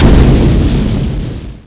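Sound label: explosion water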